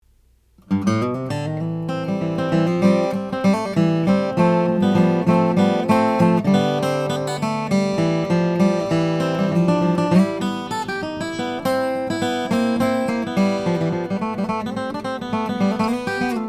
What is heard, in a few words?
martin 000-42vsk amber, guitar; 1933; amberburst